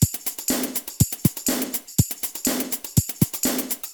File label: Light Happy Beat 122 Bpm